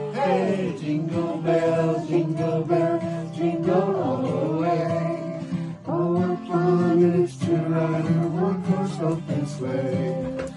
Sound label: jingle Bells; rotarians spread good cheer in wards at LBJ hospital